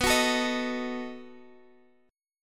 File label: B 7th B, Guitar, Open G